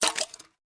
crab juice pickup; superheroes2

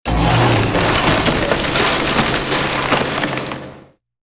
wood chipper; worlds largest redwood

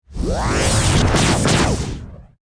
tech attack; superheroes2; felon

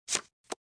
cards card flip; burns casino

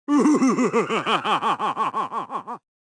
gnome laugh

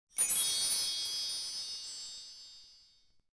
trophy sparkle tap; pinpals

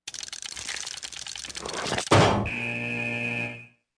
factory

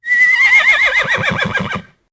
horse tap

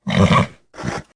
horse job good

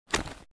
pickups; corporate; secretagents

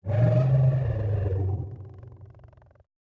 ambience; superheroes2; pinchy

